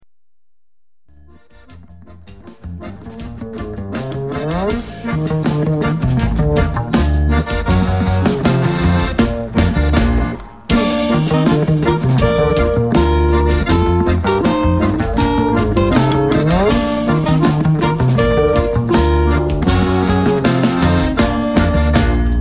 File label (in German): Boarischer